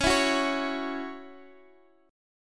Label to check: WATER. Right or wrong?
Wrong